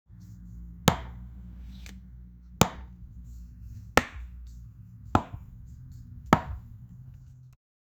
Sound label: shooting a soccer ball